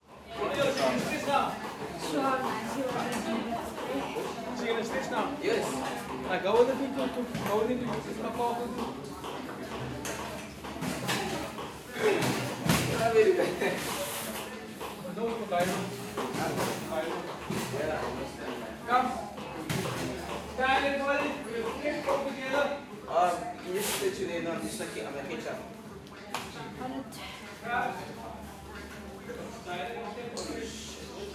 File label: South African Ambiences Interior; TheWorkRoom Audio Post